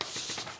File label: paper flip longer